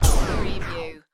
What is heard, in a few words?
Turntable Scratching Stop Brake